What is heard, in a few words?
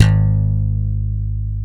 G#0 slap bass, bass; AKAI S-Series CD-ROM Sound Library VOL-8